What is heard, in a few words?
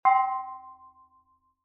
tone